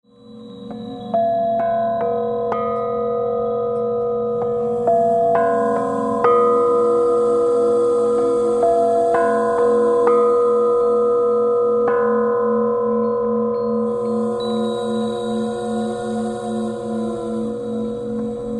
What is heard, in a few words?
Bells